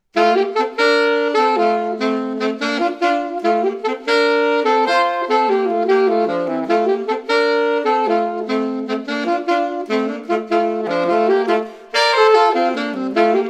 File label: Duet; Duet No 1